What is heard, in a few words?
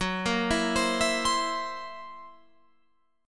F sharp 7th Flat 5th F sharp down Guitar Open F; arpeggiated; F#7b5